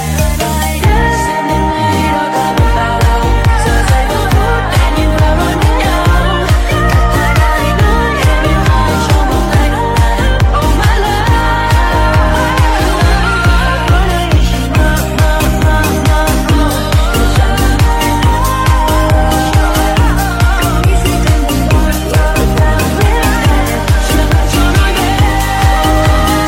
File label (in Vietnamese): nhac chuong remix